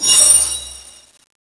magic knife charge finish, weapons

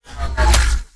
sb mine draw, weapons

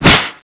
spider skill; arachne

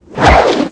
whip sword slash

